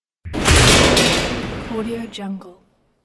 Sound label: strike fx